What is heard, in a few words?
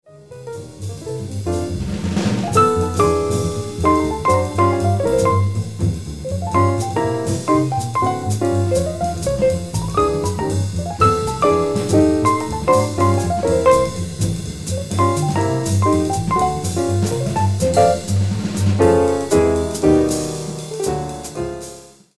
Trio out head